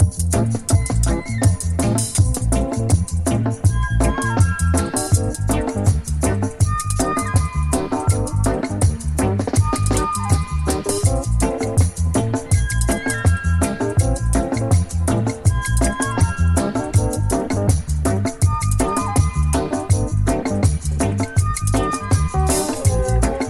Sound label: oboe